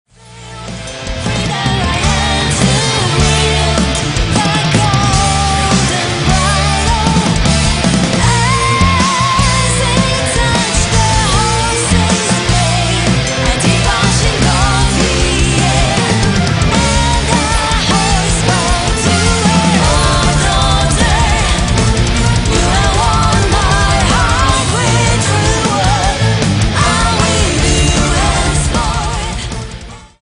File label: tala